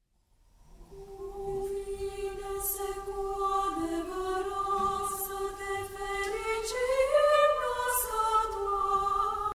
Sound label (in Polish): Prawosławny hymn, Chór; Axionul; Paul Constantinescu; Bukareszt; Rumunia